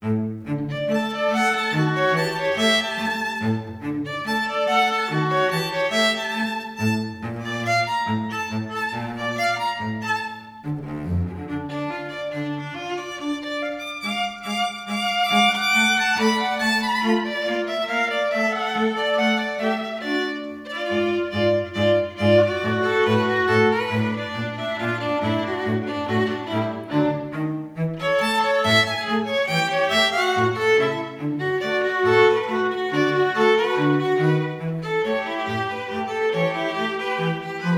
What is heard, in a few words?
maple leaf rag